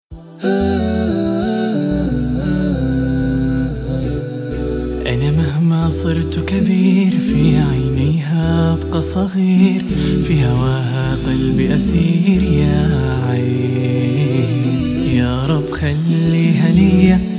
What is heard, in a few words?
Acapella; Samaa Network